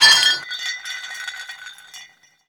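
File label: aluminium bottle roll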